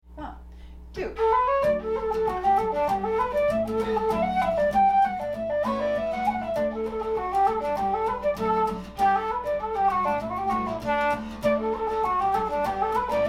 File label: Flute and guitar